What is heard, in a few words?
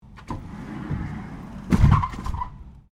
Door Slide Industrial Freezer Open Stereo, Industrial freezer sliding door